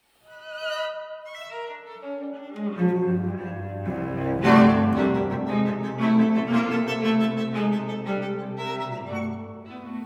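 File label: String Quartet, Playful Cantabile; String Quartet No 3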